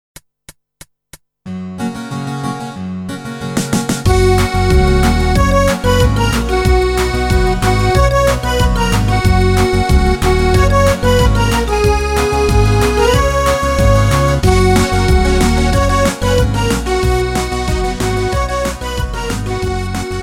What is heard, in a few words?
MIDI